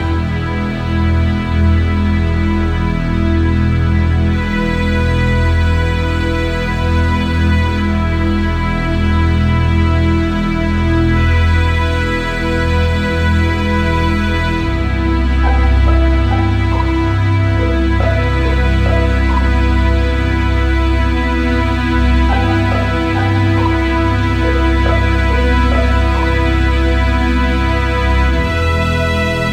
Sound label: BGM Occasion; dusk diver 2; ps4 ps5 switch windows gamerip 2022